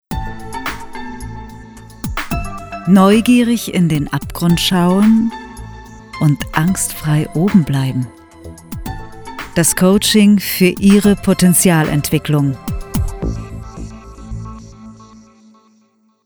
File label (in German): Werbejingle